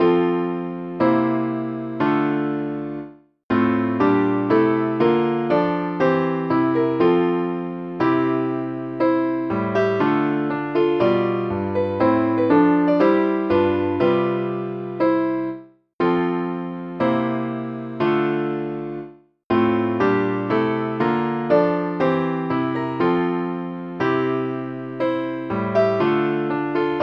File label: theme from the magic flute, cello; ensemble